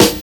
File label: NAUSEOUS SN, HIPHOP; Drums In Your FACE!